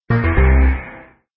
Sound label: lose; ColorPin